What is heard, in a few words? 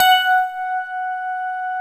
GRAND PF F#4, AKAI S-Series; AKAI S-Series CD-ROM Sound Library VOL-7; D.GRAND PF